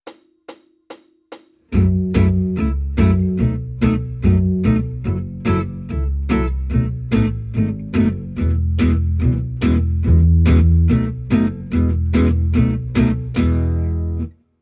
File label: Jazz, Guitar; Guitar Techniques 2019 Issue 290 January